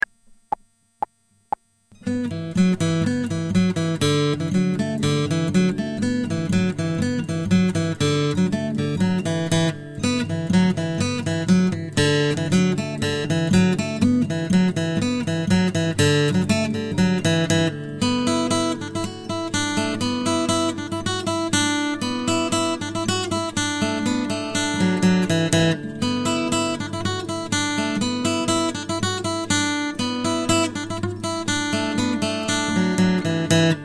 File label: Polka